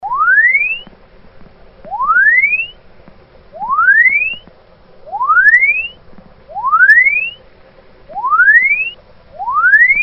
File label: chirp